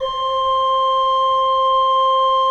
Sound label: C4, Cathedral Organ, ROHRFLOETE; Propeller Island